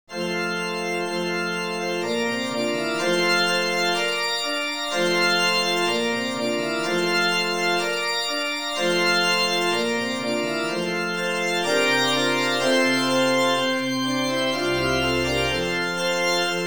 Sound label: Now Thank We All Our God With Organ, handbell